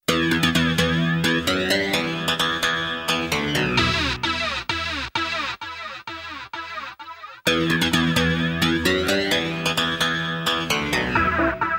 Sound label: ringtone